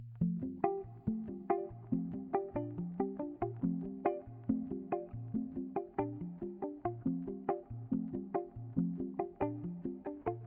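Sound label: compound, electric bass; This texture is comprised of four rhythmically repeating individual parts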